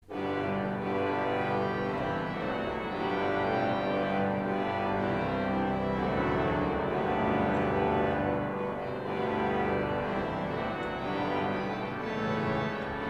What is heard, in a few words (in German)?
Cornopean Reihe, Orgel